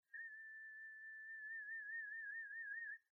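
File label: sing sample, Harmonic Series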